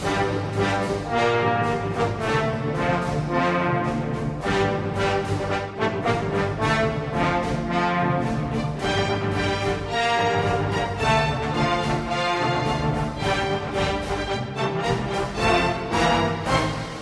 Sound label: axis win